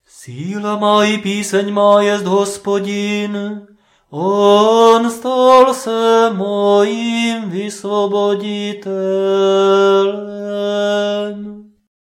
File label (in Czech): Nedelni prokimen hlasu